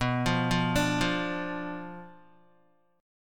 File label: B Diminished B down Guitar; Bdim arpeggiated